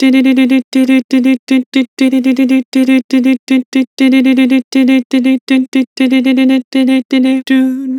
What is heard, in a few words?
Vibrato "Doo", Vocals; Harmor Vocals